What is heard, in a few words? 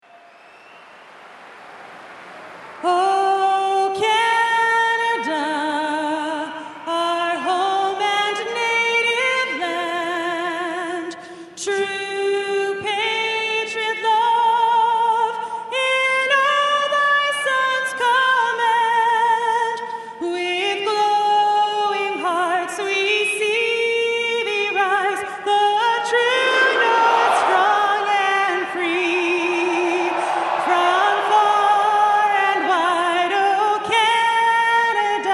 jets anthem